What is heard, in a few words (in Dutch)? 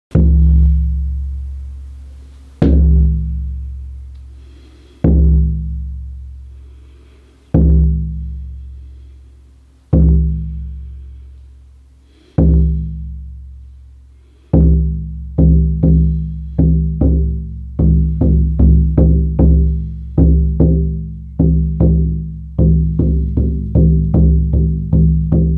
wit damhert 45, sjamanendrums